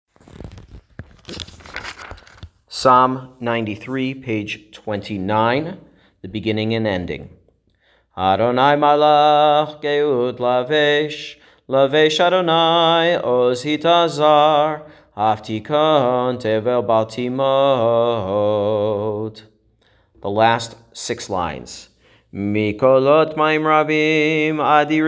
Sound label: Psalm 93